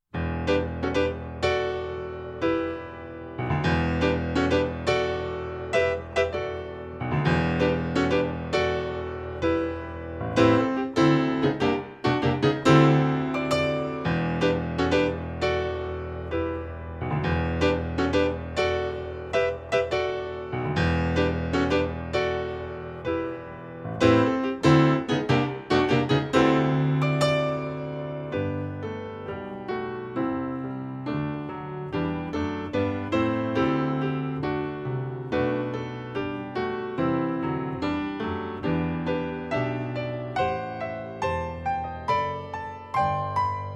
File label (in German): Psalm e-moll Dur